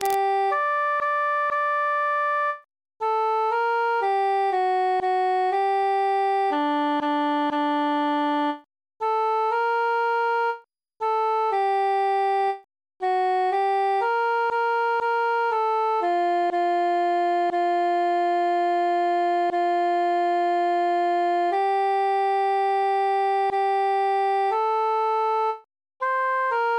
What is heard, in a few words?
altus solo; adew sweet amarillis